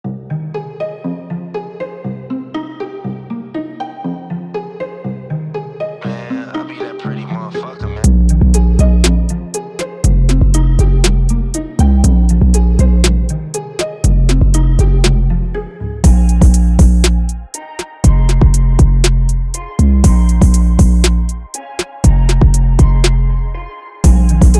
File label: 120 bpm